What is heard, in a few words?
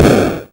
boom